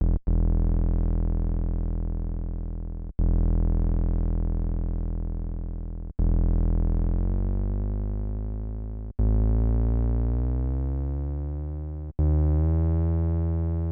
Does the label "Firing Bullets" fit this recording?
No